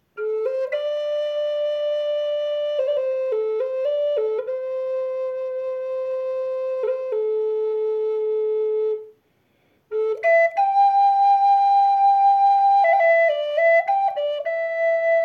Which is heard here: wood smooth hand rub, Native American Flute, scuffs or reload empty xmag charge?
Native American Flute